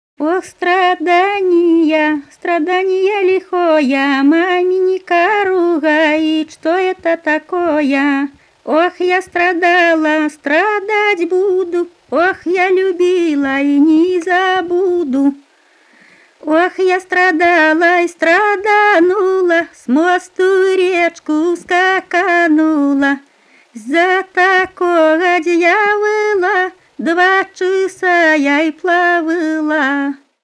Oh stradanie stradanie lihoe stradaniya chastush, Pskovcshina; chastushka